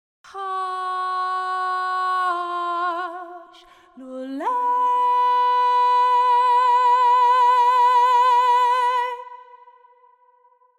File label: Hush, Lullay, vocal phrase